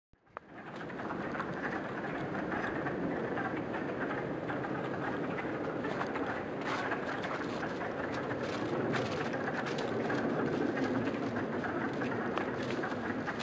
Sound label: frogs spring pond; estabrook